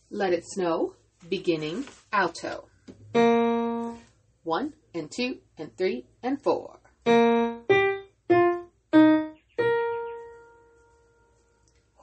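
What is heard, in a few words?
alto, study tracks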